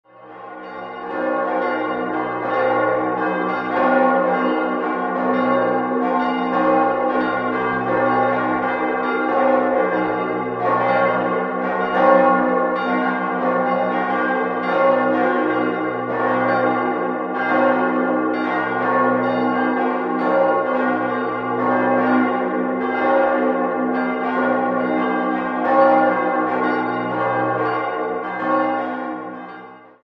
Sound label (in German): Kastl alt, Glocken